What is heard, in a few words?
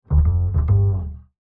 Bass, Jazz for piano